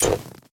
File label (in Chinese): 修复狼甲声